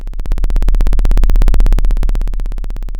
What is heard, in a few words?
Sound of a plane taking off